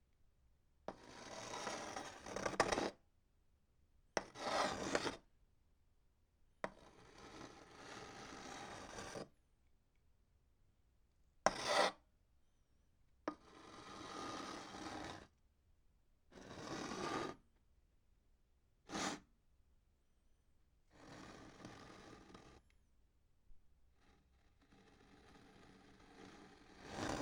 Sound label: Metal Dragged On Wood